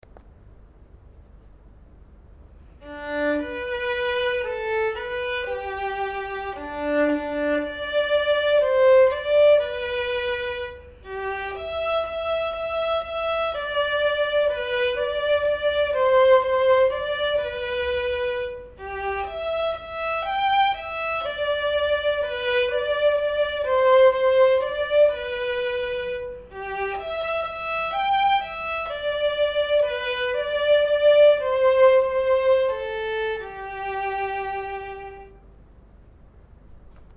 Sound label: perf vln